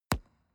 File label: explosion bass; hits-explosionen